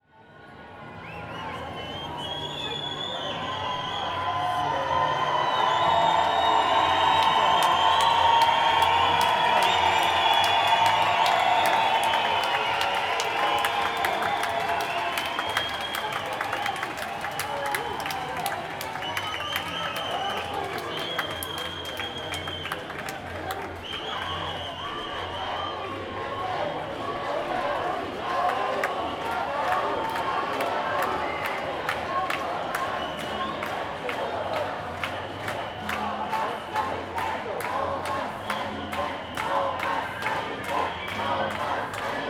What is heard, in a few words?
Protests in France